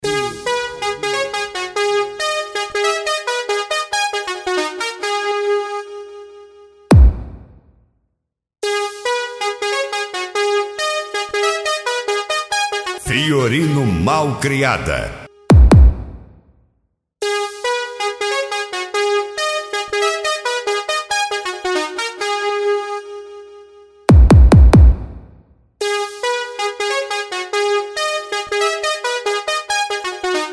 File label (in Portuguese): esp mega funk bass